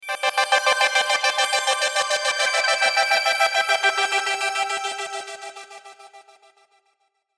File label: Sweeper Pulse Synth; Swirl; Blastwave; Production Element Imaging Element Accent Transition